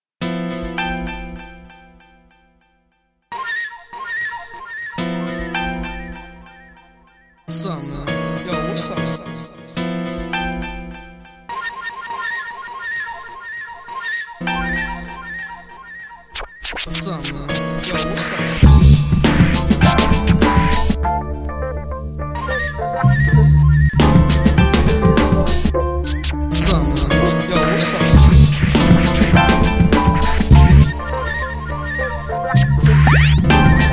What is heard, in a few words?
instrumental